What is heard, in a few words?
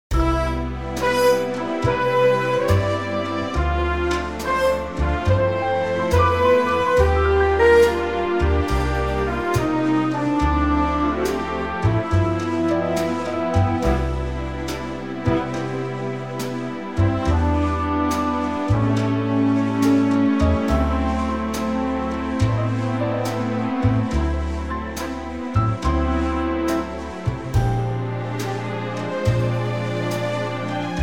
C orch